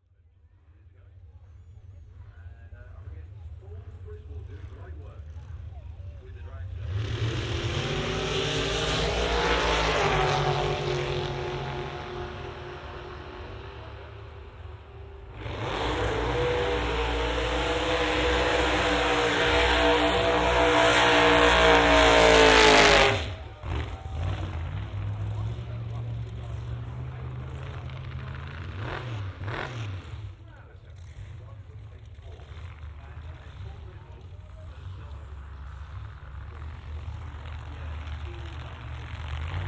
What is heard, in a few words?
soundscape a binaural recording at santa pod finishing up with the jet car